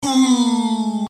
ouuuuhh

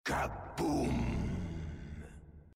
kaboom

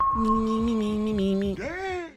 notification

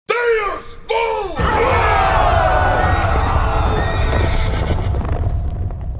crusade sound effect

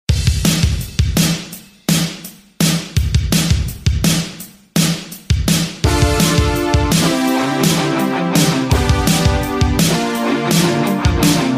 chopping music

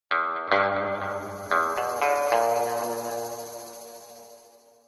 magnum equip sound; fallout new vegas

